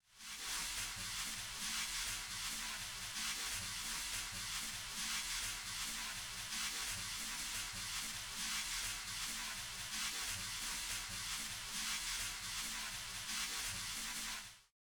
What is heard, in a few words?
brushes on drums